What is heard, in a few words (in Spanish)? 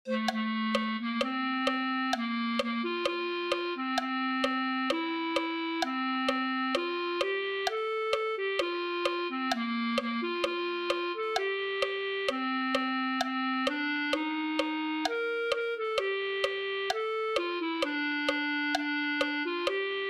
clarinete